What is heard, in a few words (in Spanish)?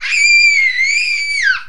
Grito